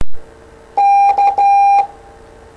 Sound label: Morse K